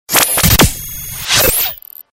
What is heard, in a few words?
Galery Noise; Sound Ideas - Galery Noise 002